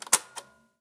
record up; Mass storage; TS2020; zxsp

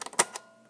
play up; Mass storage; TS2020; zxsp

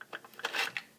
eject tape, Tape recorder +2A; Mass storage; zxsp

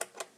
pause up; Mass storage; TS2020; zxsp

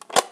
close lid loaded; Mass storage; TS2020; zxsp

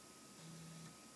step burst, Floppy +3; Mass storage; plus3 disk; zxsp

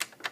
pause off, Tape recorder +2A; Mass storage; zxsp

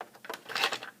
insert tape, Tape recorder; Mass storage; zxsp

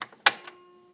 record off, Tape recorder; Mass storage; zxsp

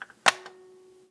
close empty, Tape recorder +2A; Mass storage; zxsp

